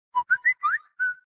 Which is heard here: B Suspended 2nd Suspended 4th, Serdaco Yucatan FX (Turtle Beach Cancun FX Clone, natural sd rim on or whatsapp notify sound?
whatsapp notify sound